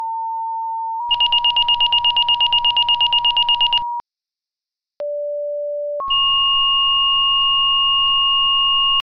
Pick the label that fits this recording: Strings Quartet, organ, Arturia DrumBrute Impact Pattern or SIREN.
SIREN